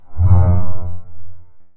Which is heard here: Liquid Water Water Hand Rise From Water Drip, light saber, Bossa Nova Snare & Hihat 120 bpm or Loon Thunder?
light saber